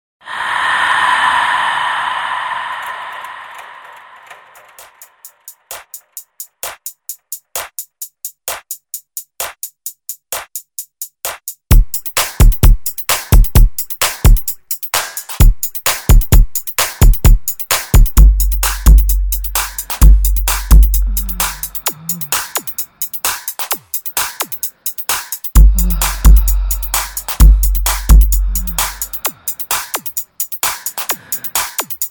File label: Orgasmic Beat